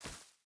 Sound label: footstep barefoot grass run; Rust